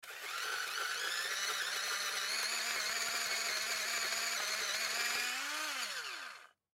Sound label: Pencil Sharpener Small Electric Choppy; Office; Blastwave